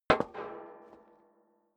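step dress boots metal rattle single right, footsteps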